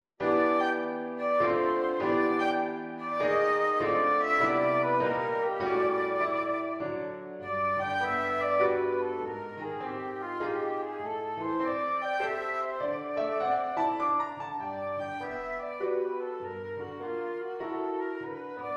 FLUTE Flute Swing

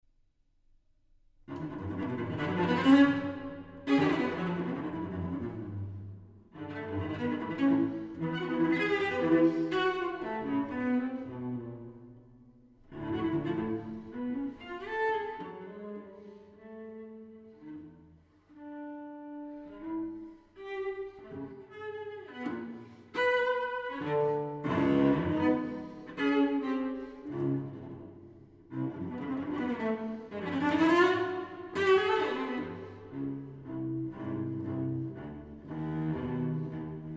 String Quartet; Edward Cowie; 'Birdsong Bagatelles'; Bullfinch

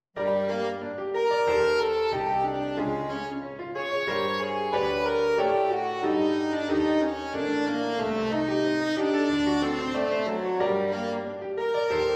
SAXOPHONE STRANGE SAX

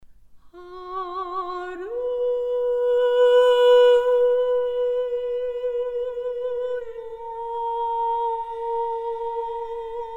sho

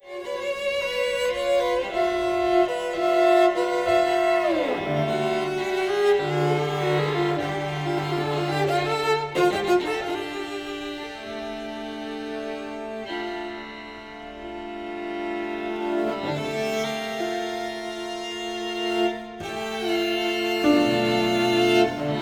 Piano Trio vln vc pno; Barbican Piano Trio

